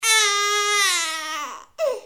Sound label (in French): Bébé; bruitage; Bébés